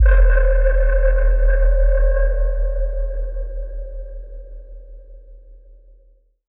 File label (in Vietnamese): Am thanh tuong tuong vien tuong